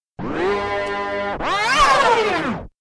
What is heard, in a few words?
Sms Scratch